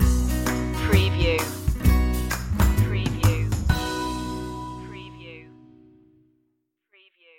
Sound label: i feel alright stinger